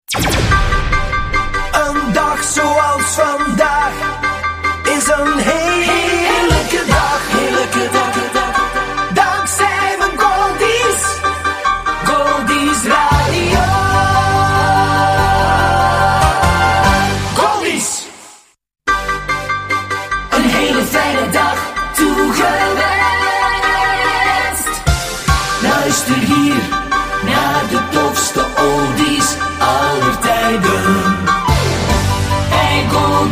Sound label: jingles; Goldies